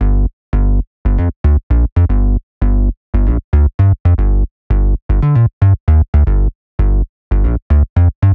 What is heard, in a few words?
G Bass King Bee; Zero-G; Future Wave